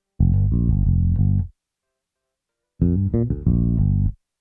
Call And Response Bass A, 70s Analog Funk; Sonic Foundry (Sony Creative Software); What it Is!

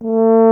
tuba A; Miroslav Vitous; Solo Instruments VOL-2

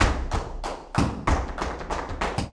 Flamenco, Tapping, Tango Con Baston, 188

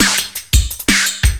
LOOP SD, Drum & Bass, 170 BPM

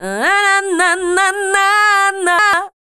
Dance Vocals, FEMALE

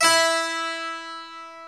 Harpsichord; E-MU Producer Series Vol. 2; More Studio Essentials; Keyboards

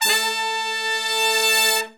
BRS LONG, R&R Horns, Sect; Roland L-CDX-03

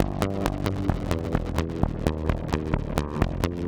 Jen Pulses A 130 G, modular 130bpm; modular madness

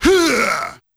E4 "Huuaah!", Yamaha PSR-E323 Sound Effect Kit

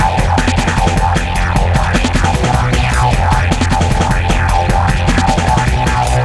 Hardcore Breakbeat, 153 Fmin; Big Fish Audio; Hardcore Breakbeat Action; Dark Star

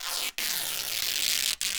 RIP, Canvas Bag Rips

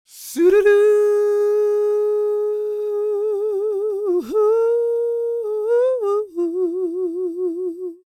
CROON, Soul

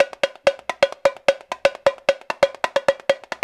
Rio Batucada, 130 bpm

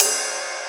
Ride, Rides; Electronisounds

